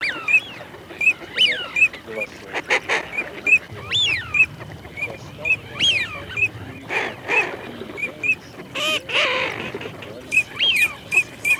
Чирок-свистунок Alzavola Свиязь Fischione; plurifon

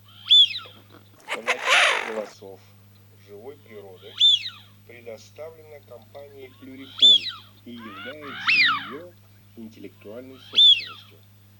Свиязь Fischione; plurifon

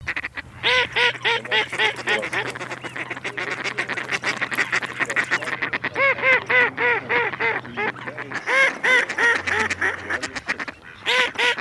Кряква Germano

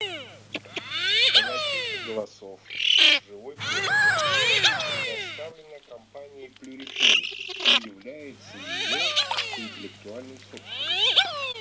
Красноголовый нырок Moriglione; plurifon

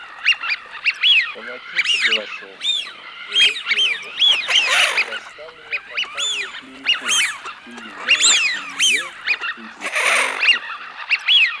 Свиязь Fischione; plurifon

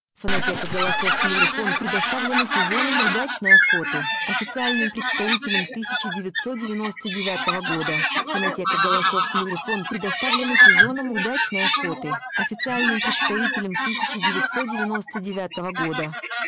белолобый; plurifon

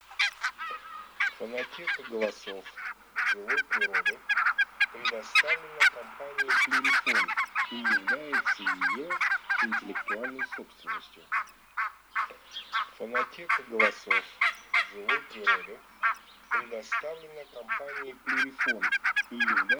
Казарка белощекая Oca Faccia Bianca

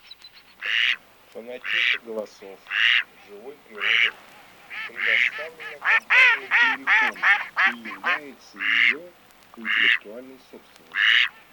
Кряква Germano; plurifon

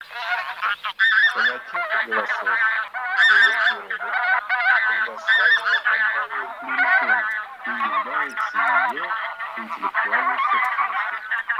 Гуменник базар Oche Granaiolle

